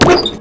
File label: Dart; Toy Story Mania; iOS gamerip